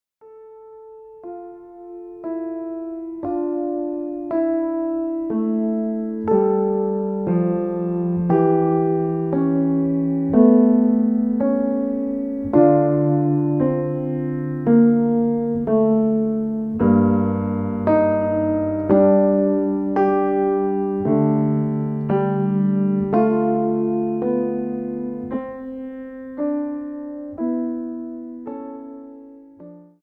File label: Prelude in D minor, Keyboard Studies